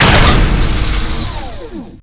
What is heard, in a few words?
player turret Place